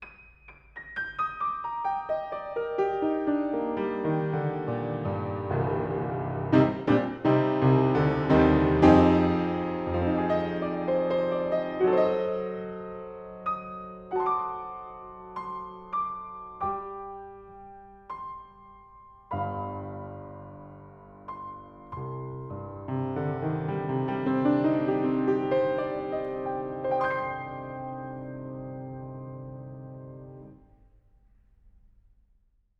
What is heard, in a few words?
Piano, Distance 140cm Mic